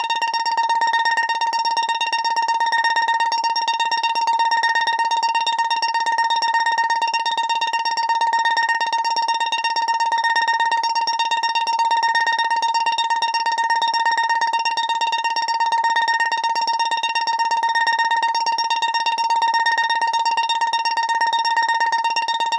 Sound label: Right Warning; pong; Pitch Black Audio Pong